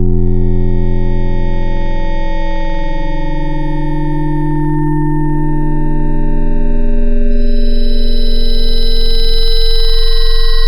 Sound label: Metallic Bell; Synthetic's Serum Collection; Echo Sound Works Core Tables